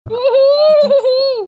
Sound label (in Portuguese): risadinha